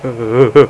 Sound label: shiver, People; Sound Library 2000; Wayzata Technology